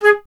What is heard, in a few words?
FLT FLTG#, Flute; Miroslav Vitous - Solo Instruments VOL-2